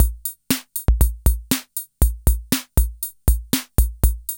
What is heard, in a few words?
ROCK; INIS - Funky Xtreams; KR55 LP4